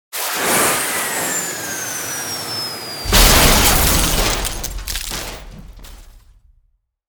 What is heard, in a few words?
crash sound effect; myro the musical